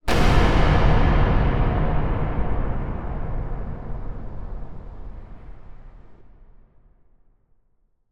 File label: sound design hit impact metal large horror cinema